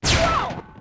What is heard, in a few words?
HIT; XBOX; Crash Bandicoot The Wrath Of Cortex